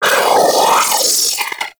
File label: Sentient Battalyst Vocal Attack; Warframe; Sentients